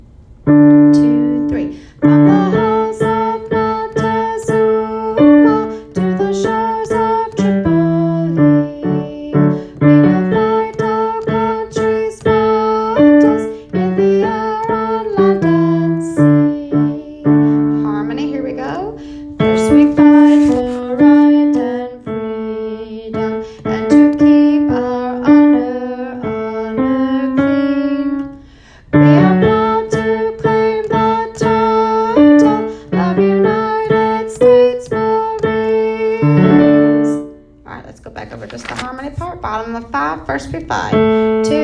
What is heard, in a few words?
Marines tenor, military songs